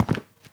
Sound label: concrete, footsteps; Sourceforts2.0